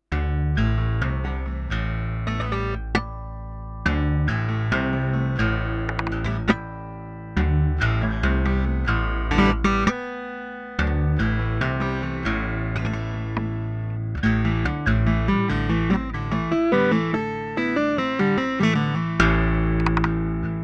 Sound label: yamaha apx500